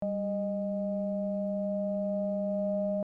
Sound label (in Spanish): Multiarmónicos